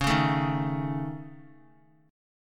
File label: C sharp Suspended 2nd Sharp 5th, Guitar; strummed; x,4,1,2,3,x; 4F,4A,5C,5G,5C,6E